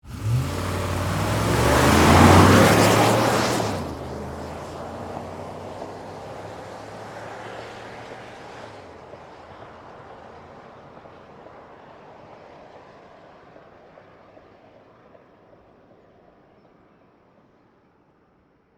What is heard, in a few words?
Ford Expedition, Suv, Drive, Away, Fast; Transportation, Automobile; Car Sound Effects; BlastWave